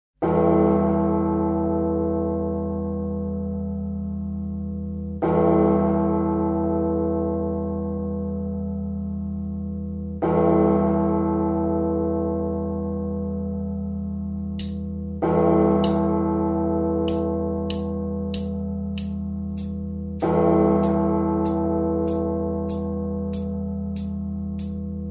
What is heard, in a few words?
Backing Tracks